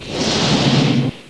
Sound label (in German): Geister Bahn Aus